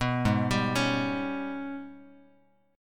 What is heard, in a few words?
Ab Minor Major 11th Ab, Bass Standard; arpeggiated; 19,11,17,18; JGuitar